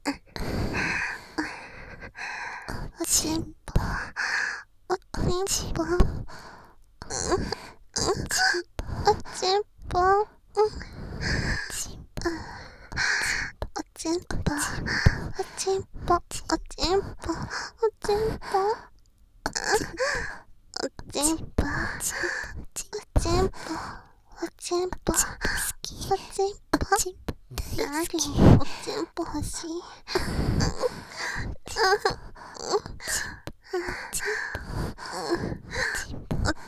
喘ぎ; 由真; お屋敷メイドのハーレム淫語ヘヴン 気が済むまで耳舐め淫語を堪能できるバイノーラルオナサポ音声

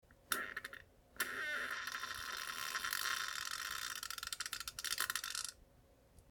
ぷりぅぅ ぷりぅぅぅぅぅぅ っ, ふぇち音 下痢の音

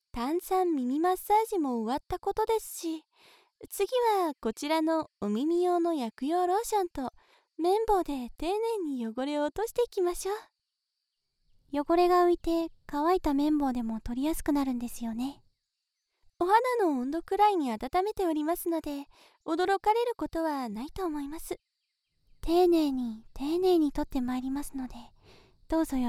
薬用 ローション を つけた 綿棒 で 丁寧 に 耳かき

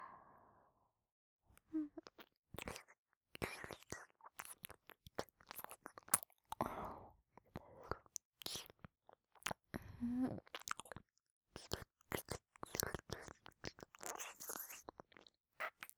Wナース耳舐め; 七海さん六海さん; 癒し系専属ナースの七海さん六海さんに愛されちゃうバイノーラル

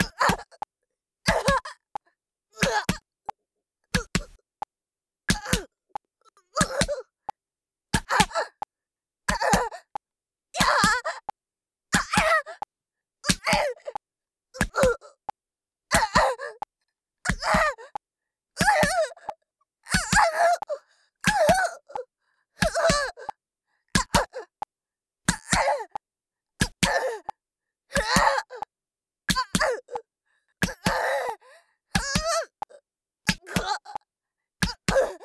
ワンツーメト BPM90, メトロノームあり

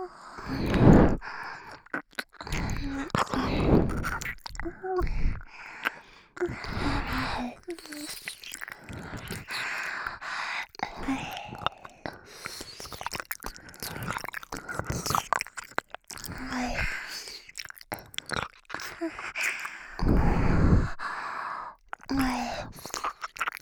ぐっぽり耳舐め; 二人のぐっぽり耳舐めトラック

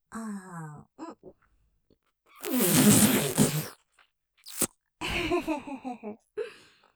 舐め; あーん、びゅちゅぅうう; ループで楽しむ！シェフの気まぐれ抜き抜きコース

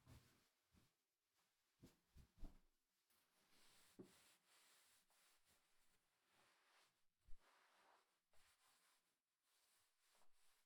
自分 タオル で 体 を 拭く, お風呂に関する音; KU100

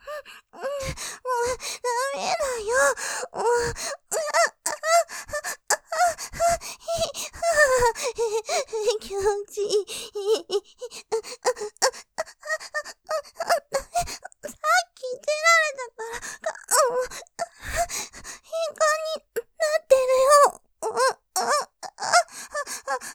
喘ぎ 笑いが出ちゃう; さらにとろとろで; もっと感じちゃう; ループで楽しむ！シェフの気まぐれ抜き抜きコース; モノラル

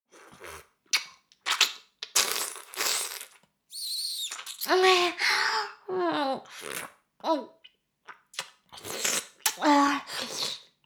耳舐め音声; 牧場戦腺; 少尉

